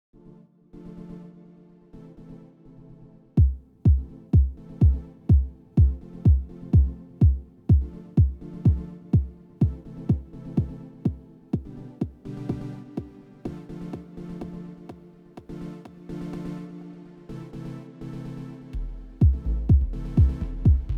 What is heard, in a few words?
Acid Bass